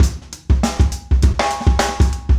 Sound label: funky